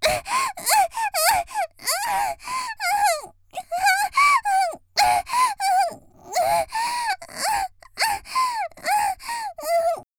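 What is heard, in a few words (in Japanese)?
（少女）「喘ぎ」無理矢理されて嫌がる, 元気な少女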